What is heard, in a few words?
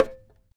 tar; Roland - LA Composer vol. 3